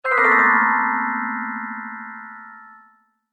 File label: Haunted Chimes, Scary Tone Sound Effects; horror